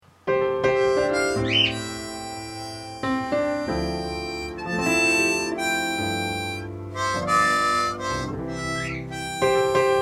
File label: harmonica ballad